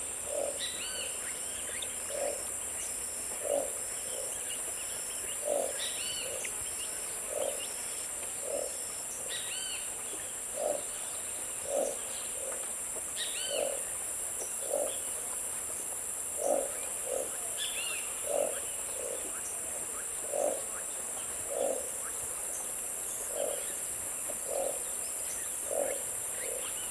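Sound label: chirping of birds greeting a new day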